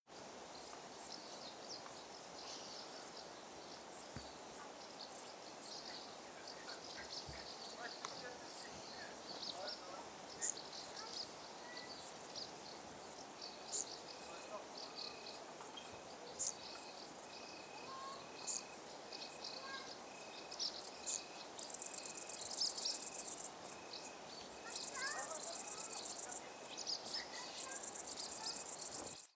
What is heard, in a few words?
Concert Village Soir; Forêt domaniale de Vouillé-St-Hilaire, Quincay, Vienne (altitude 150 m)